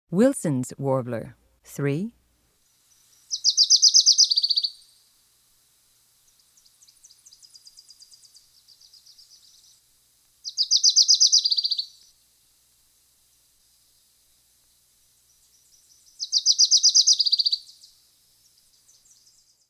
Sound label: wilsons warbler